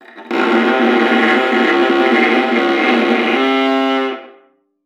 KRATZ, VIOLA FINGER; Xsample VOL-5

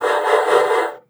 PUSTE, bassoon; Xsample VOL-6

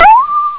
gegner cry; ST-XX Amiga Soundtracker Sample Packs

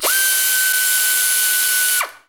SCHRAU; Best Service; Advanced Mega Effects

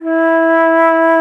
FLOTE, Alpine Volksmusik; Best Service

